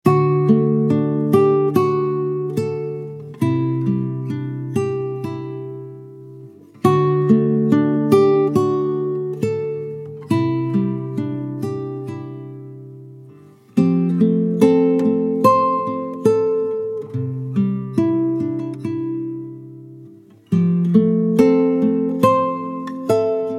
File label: intimate solo guitar